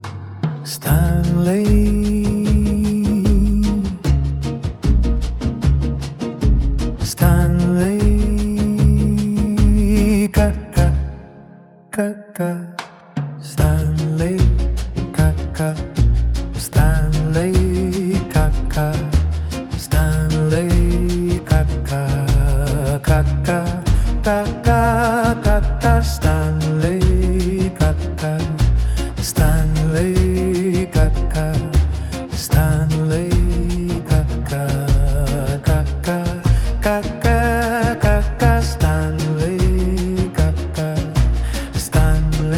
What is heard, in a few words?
bossa nova